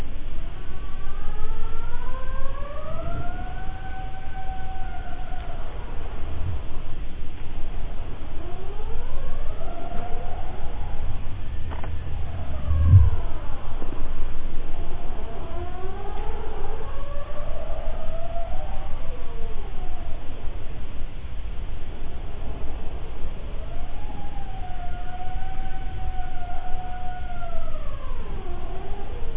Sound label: Ice singing